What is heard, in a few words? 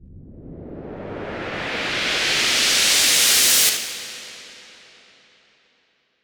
Noise A; 90’s Eurodance